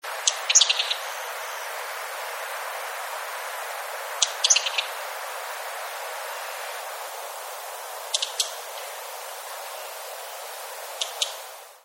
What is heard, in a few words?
oruri